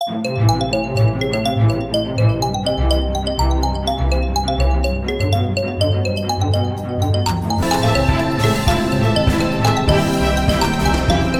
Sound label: VIVO LATEST RINGTONE